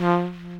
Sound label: Breezy Trumpet F2